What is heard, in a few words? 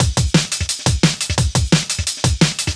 DNB BREAK